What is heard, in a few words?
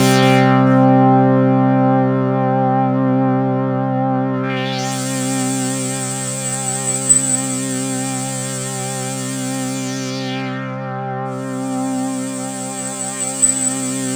Roland JV 2080, interval; MG INTERVAL